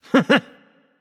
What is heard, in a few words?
loud sound; smite; voicepack; osiris